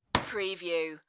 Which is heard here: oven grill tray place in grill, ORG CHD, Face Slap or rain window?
Face Slap